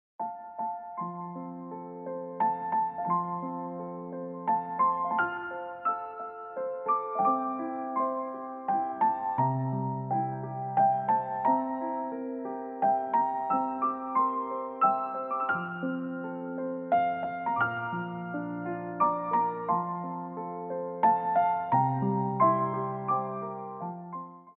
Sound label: Brahms Lullaby with Away in a Manger